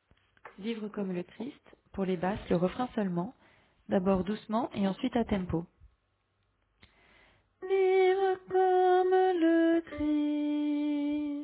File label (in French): Basse